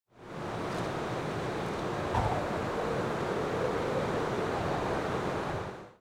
snow Storm

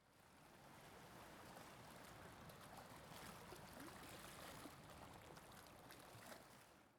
night seaside

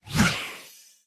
throwing light; fishing

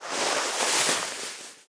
snow

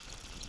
fishing reeling

